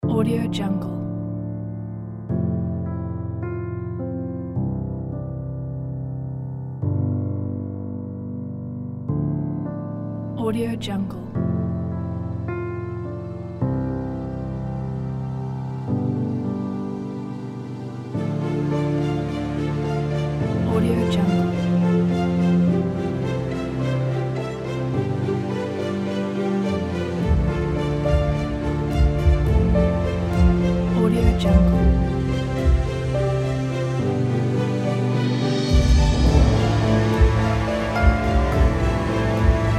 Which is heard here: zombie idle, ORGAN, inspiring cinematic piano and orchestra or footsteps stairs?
inspiring cinematic piano and orchestra